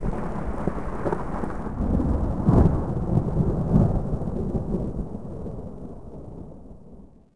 distant Thunder; Star Wars Obi Wan; XBOX

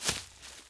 clothing scrape vegetation

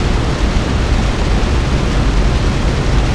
vent; Tony Hawk's Project 8; XBOX

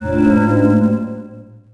distract

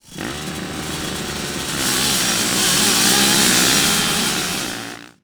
Start, UI

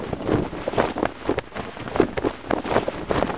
flag; The Elder Scrolls III Morrowind GOTY; XBOX

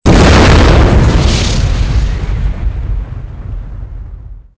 sam boom, weapons; Soldier Of Fortune II Double Helix; XBOX

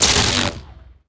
laser bolt; weapons

